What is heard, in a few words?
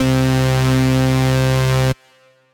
Solo, Synth Lead; Chocolate Sundae Solo; Ableton; Factory Packs; Chop and Swing